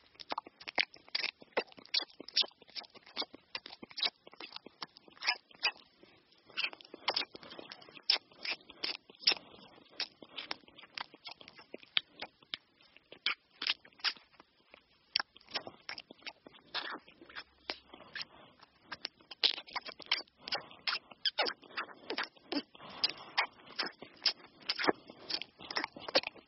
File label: Breastfeeding; Nursery